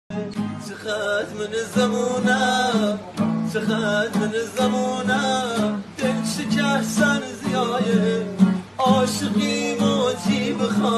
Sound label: Guitar